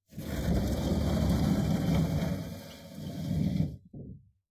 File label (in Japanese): 襖をあける音; door